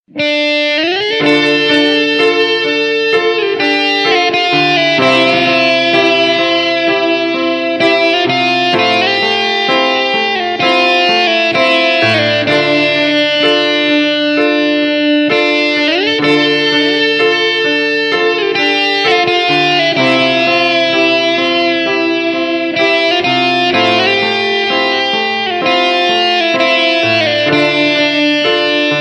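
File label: Sad Guitar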